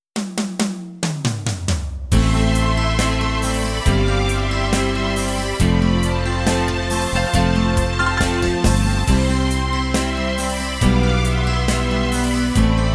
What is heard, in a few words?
Hollywood Solo